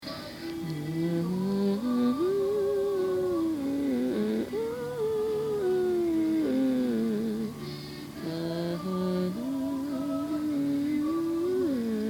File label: humming airport